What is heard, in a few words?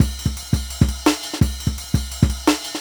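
Jungle Breaks, 170 bpm; Hol Up; Original Jungle Breaks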